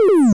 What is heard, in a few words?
shoot, attack sound; カタナ１